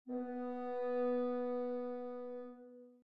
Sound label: woodwind threes